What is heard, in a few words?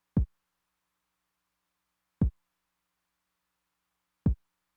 kick drum, Roland TR-909; drum machine; Roland TR-909 Ableton Project